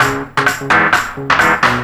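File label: JUPITER LOOP, Techno Trance; E-MU Formula 4000 Series Vol. 2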